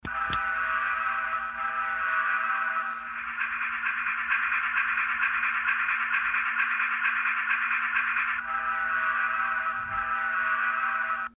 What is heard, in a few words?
train horn toys; daron; maru